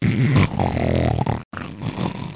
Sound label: snotted; amaze